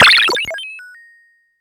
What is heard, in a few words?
Blip